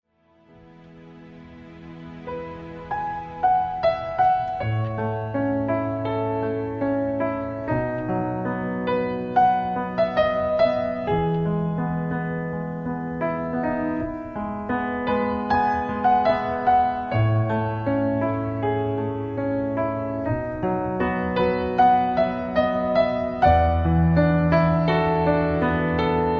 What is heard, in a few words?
solo piano